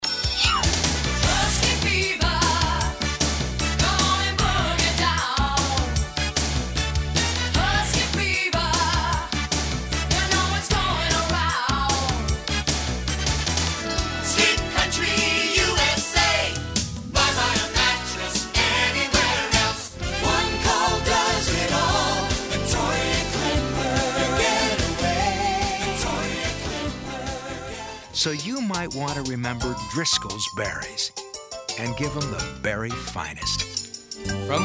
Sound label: Music